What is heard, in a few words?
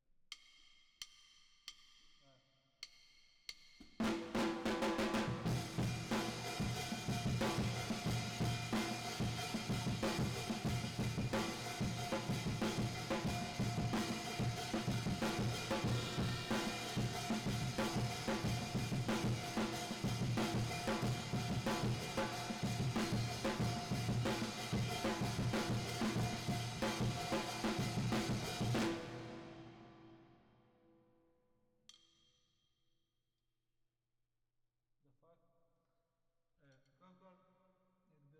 Drums Verb